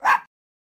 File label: bark